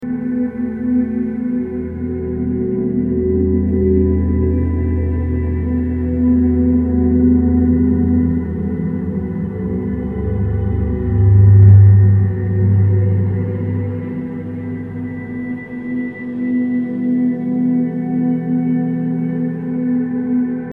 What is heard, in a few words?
Background Music